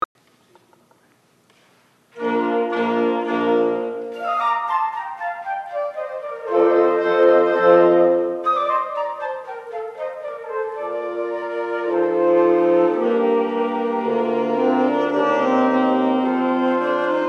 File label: quintet in Eb; mozart